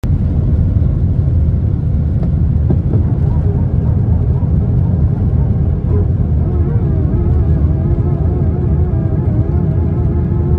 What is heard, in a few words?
Airbus; تیکاف